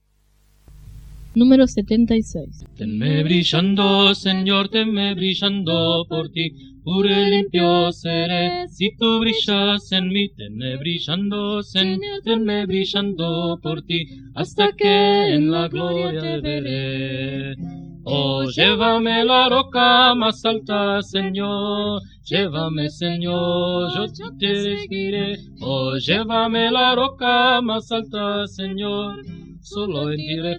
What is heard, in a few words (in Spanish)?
Coro, himnario niños